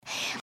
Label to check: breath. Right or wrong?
Right